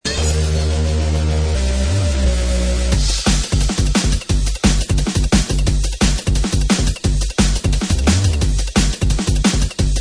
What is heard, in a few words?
Electric music; Drum & bass